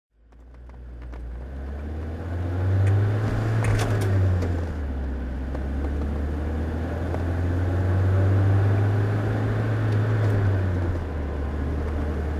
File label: citroen ds23 driving